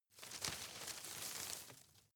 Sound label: grab medium